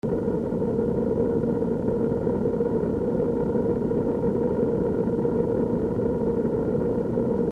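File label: wind muffled close gentle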